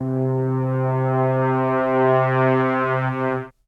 Polaris Epic Vinyl Synths C2; vinyl keys

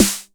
SD 909 Clean E

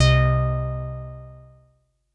SYNTH Mini Note

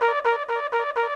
Ecliptic Trumpet A#3

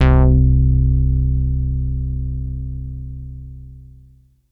BASS

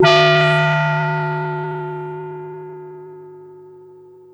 SYNTH FM; Populous

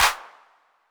Clap 808 Saturated B; Hand Clap; Multi Saturated

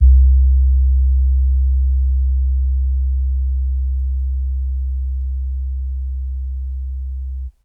DX200 Sub Vinyl Synths C1, bass

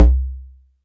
FM Perc C2

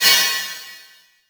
Crash Cymbal

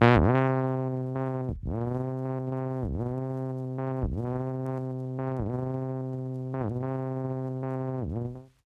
Dives Vinyl Synths C2; Kawaii; vinyl leads

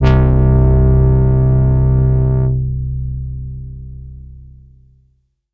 BASS Neat bass

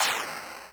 Cymbal Crushed

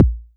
BD; TRX

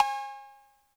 Cowbell 808 Color

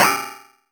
Metalica

